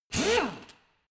impactor short soft; maschinen; bohrer

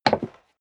step leather shoes wood creak single stair down

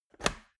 metal box small close; maschinen; soundpool

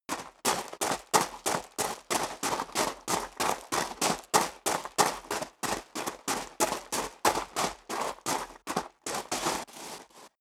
step sneaker gravel medium run sequence; schritte

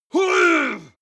male groan strain; menschen; schreie

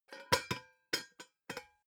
pot lid drop close long; objekte; topf

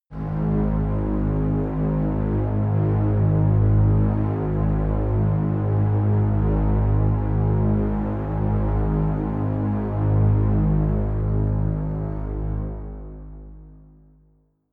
drone bass; toene

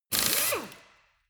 impact wrench screw single short; industrie wirtschaft; handwerk

